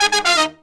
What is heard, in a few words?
120BPM, Horns